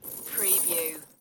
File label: Chain Metal Rattle Short